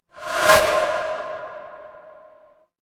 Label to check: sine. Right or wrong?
Wrong